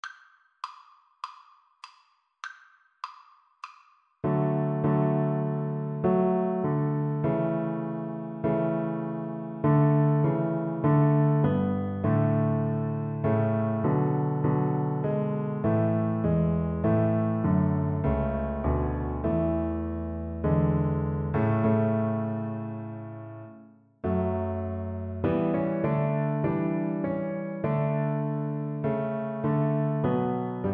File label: o come, Double Bass